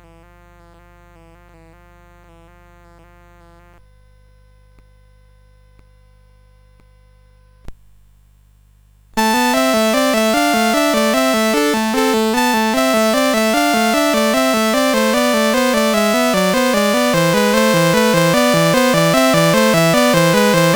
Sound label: English Suite 2 Bourree; CSG8580R5